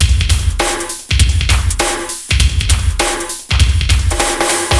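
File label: jackhammer 100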